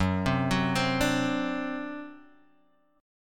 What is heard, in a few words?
D 7th F sharp, guitar